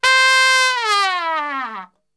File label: C 3, Brass; Best Service; Brass Super Section; TRP LNGFLL